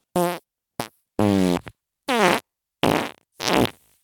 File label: fart; vegan